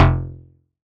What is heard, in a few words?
BAS F1, Yamaha TX81Z synth bass